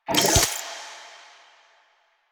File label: Charge, Sidearm; Weapons; Warframe; Tenno; Wraith Sidearm; Epitaph Prime